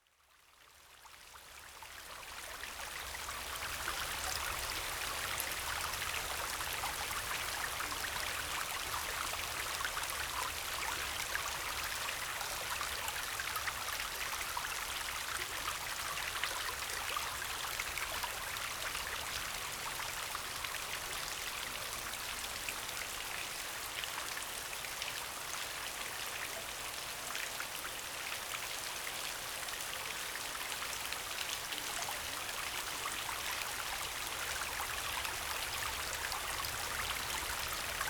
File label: Mountain Stream With Relaxing Rain